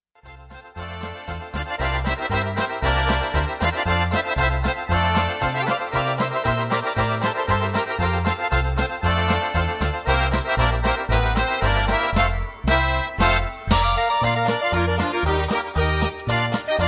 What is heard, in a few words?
Slovenian Home Polka